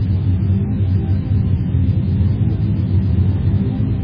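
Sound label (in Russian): работа двигателя малого самолета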